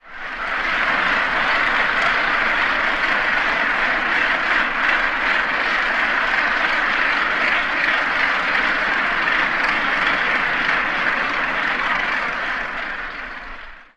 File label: clamor of huge colony